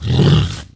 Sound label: moan; The Elder Scrolls III - Morrowind